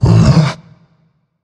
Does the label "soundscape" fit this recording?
No